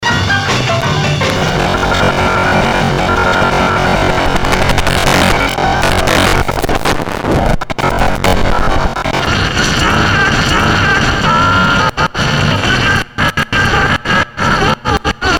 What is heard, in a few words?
CD player, Circuit Bent